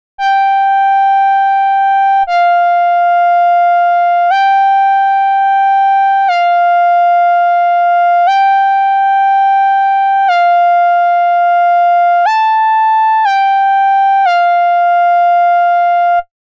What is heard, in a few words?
Moog